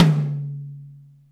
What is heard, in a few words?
STUDIO HI, TOMS; Back In Time Records; Big Bang